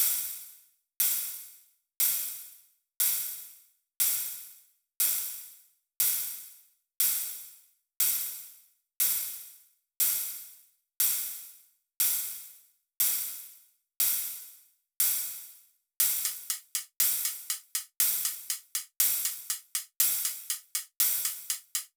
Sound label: beat